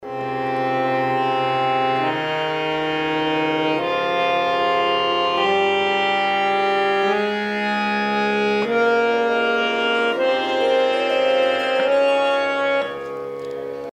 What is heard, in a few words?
Yaman Aaroh